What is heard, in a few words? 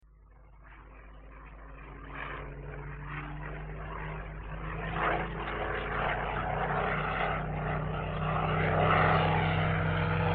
spitfire